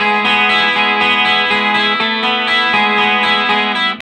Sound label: BYRDY RIF 1 E, Vintage Blues Guitar, 120; USB Soundscan vol.22; AKAI